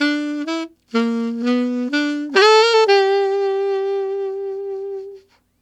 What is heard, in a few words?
Alto Country Waltz in G, Sax; Sonic Foundry (Sony Creative Software)